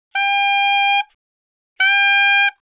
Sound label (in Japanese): Single Reed, 気鳴楽器; Saxophone